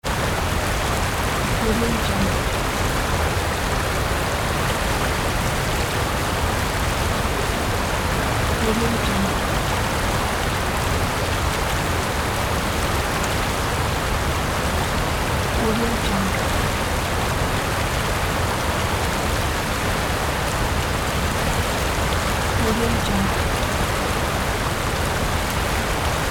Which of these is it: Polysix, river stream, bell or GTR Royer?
river stream